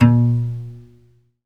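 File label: SOLO CELLO, Synclavier Strings; ILIO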